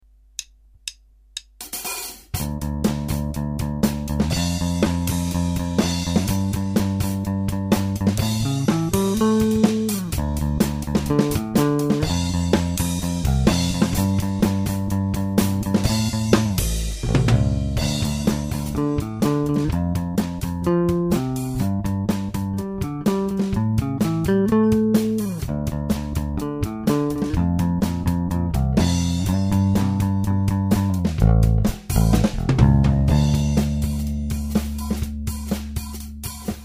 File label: Ripper, Gibson bass